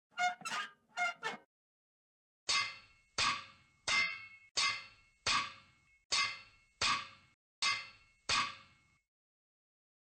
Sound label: Sharpened Stop Sign Crafting; Scrap Weapons; Zomboid